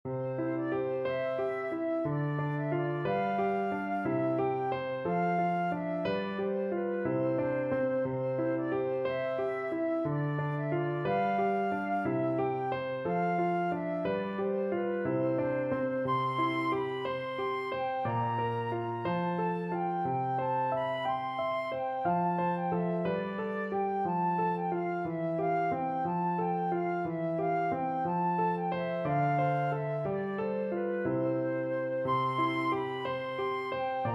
flute

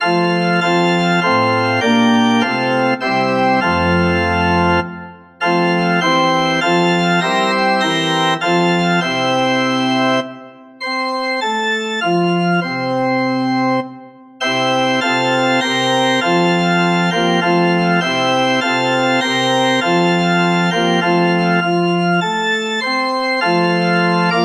ORG, organ

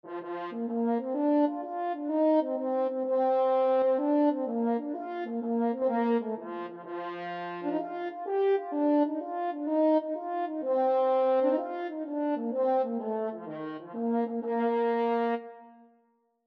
new year, french horn

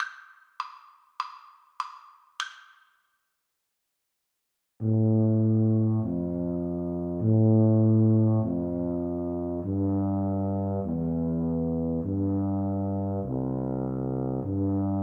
Tuba Duet

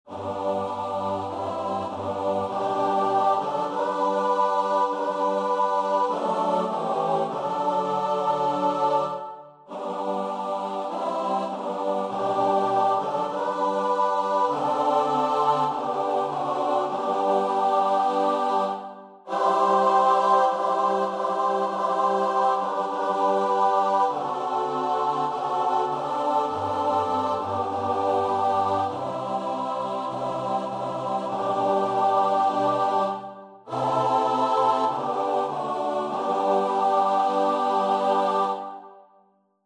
more love to thee CHOIR

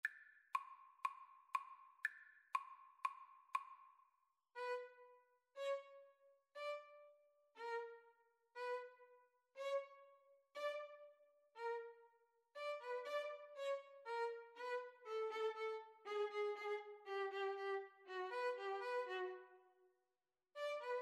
violin cello, Violin-Cello Duet; tchaikovksy sugar plum

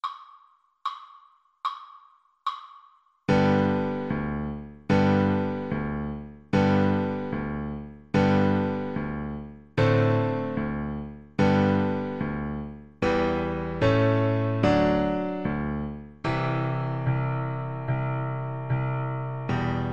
bizet carmen toreador, oboe

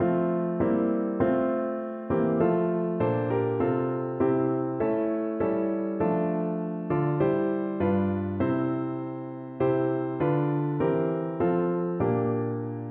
PNO

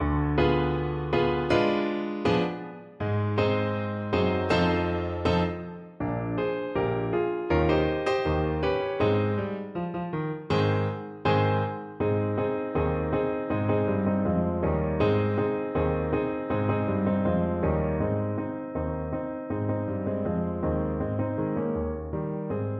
trumpet

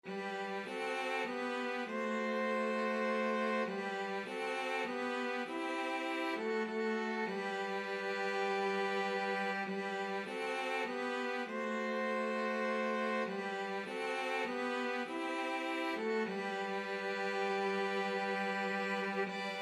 be still my soul 2 violins cello

